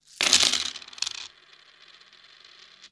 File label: coins table